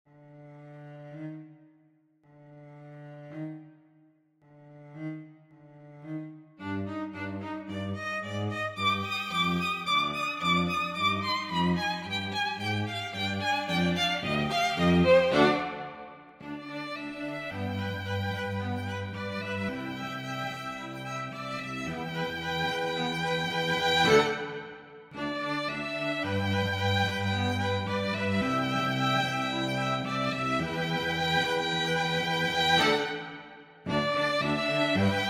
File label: String Trio